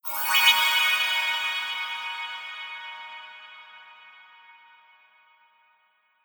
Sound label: new game; lastAvatarStandingTrivia